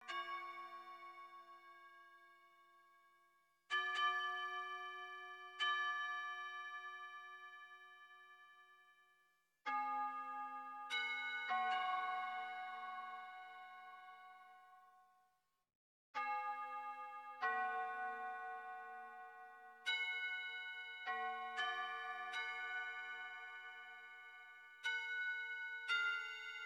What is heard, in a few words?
Wind Chimes